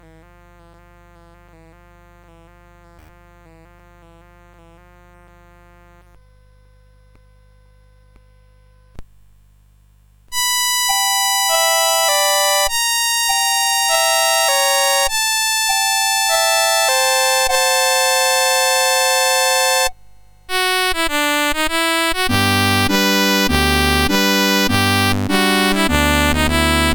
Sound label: French Ditty, CSG8580